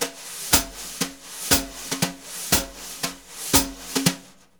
BRUSH, Jazzistic; Univers Sons

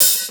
Hats and cyms, Funky Drums, Kit hits; Neil Conte's Funky Drums From Hell (1992)

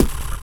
TEETH CLEAN, Vocal; Spectrasonics; Vocal Planet; Blues Soul

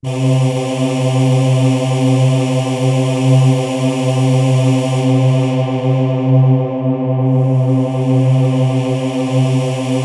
C5, Synth Line; 90 s Sample CDs; NiceBeats; SynthLine VOL-11

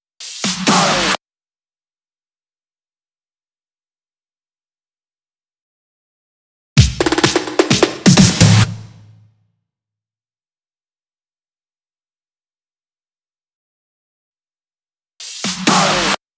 128BPM Drum Fills; Vengeance - Essential Clubsounds Vol.5; Blue Eye Kit; Root G; Drums & Perc

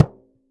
timbal lo cl; CUBA

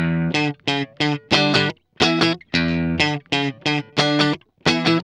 GUI 90 LICKS

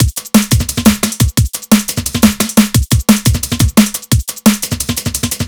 DRMLP, Drum Loops; neuro; Stanza

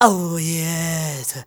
Zulu male, Heart of Africa, male dry

